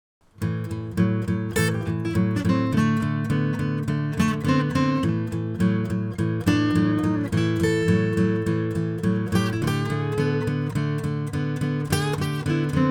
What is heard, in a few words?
A Pent rhythm; 12 bar blues in A with A pentatonic lead guitar